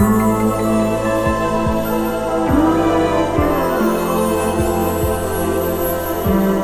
guitar, Sad

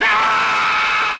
scream martial arts guy; ooh ahh

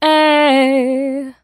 ay SUNG

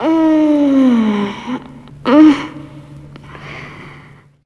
female orgasm

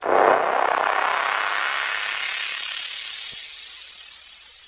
pleasing zip, Zaps and Beeps

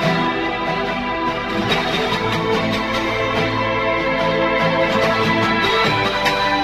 guitar spanish, Loops

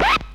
skip, Percussion, Vinyl

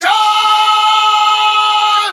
"dark", Voice

